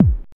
BD DEAD, bass drum; BASSDRUMS

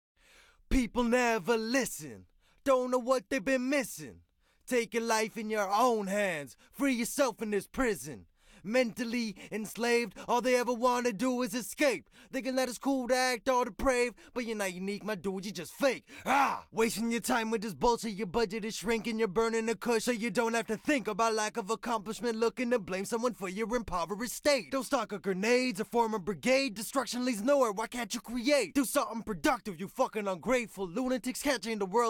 Verse Vox

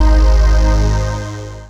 PAD

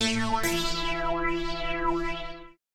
TEKNO 140, Techno; Techno2; Sphere

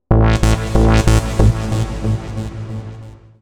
TECHNO EXTRA 140

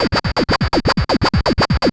SWIPP

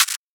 hat; Anthemic

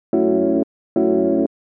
house synth 125 A, keys

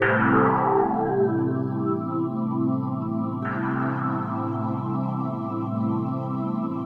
SPACE PAD 140 A; SpaceSounds

